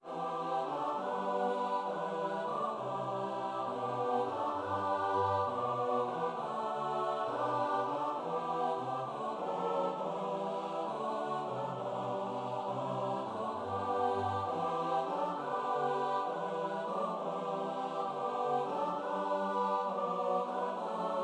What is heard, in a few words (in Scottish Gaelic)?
chi mi bhuam choir